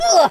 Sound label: "oof"; speech